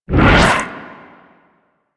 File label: Rupture Cast B; Warframe; Warframes